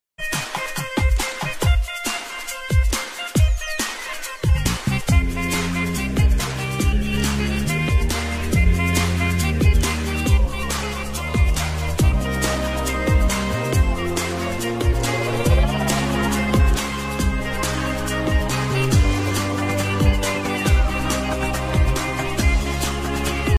marriage bgm

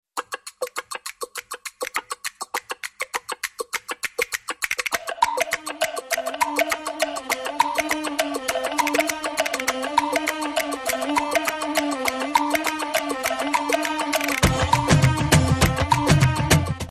Drum Beats BGM Ringtone; Acharya

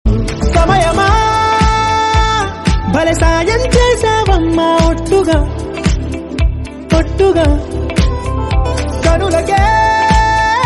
telugu love song ringtone; hi nanna movie

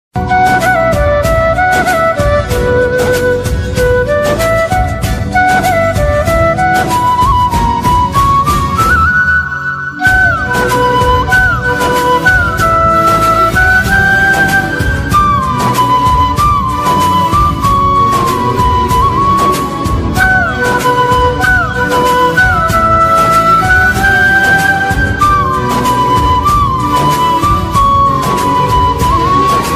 flute bgm ringtone; ismart ram

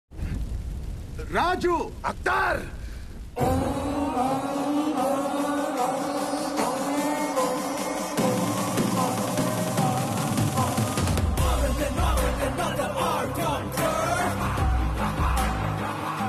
title card bgm telugu; rrr; jr ntr; ss rajamouli

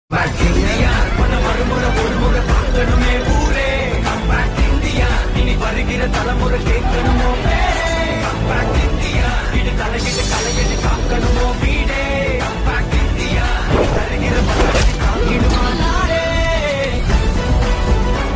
intro bgm ringtone; indian 2

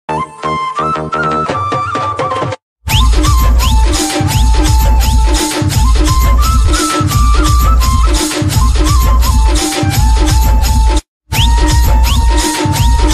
flute song; pournami movie

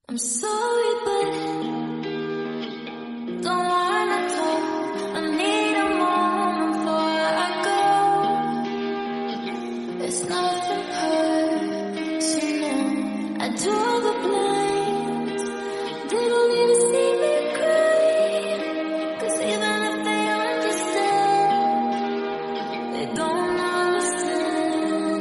slowed reverb ringtone music beats

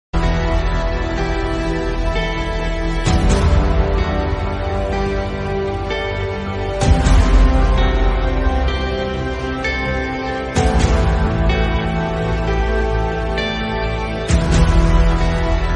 south movie ringtone, bgm ringtone; major movie; return of abhimanyu

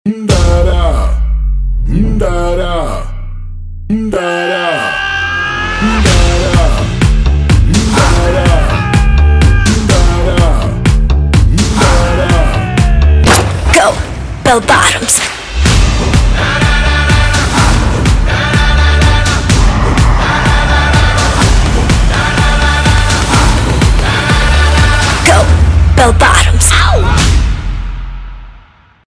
BGM

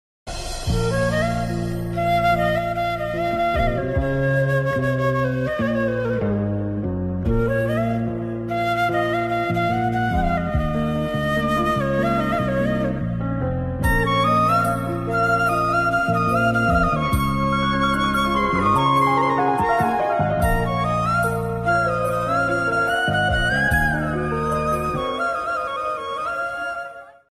flute bgm ringtone movie background music

